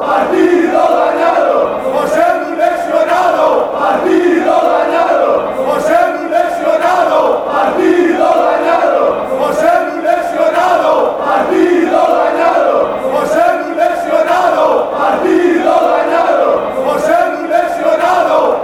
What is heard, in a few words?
won, fan chants